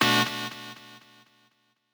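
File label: b stab2, note D octave 5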